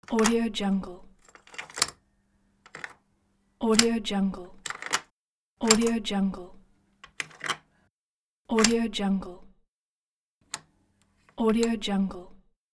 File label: door latch